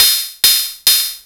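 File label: closed hat, drive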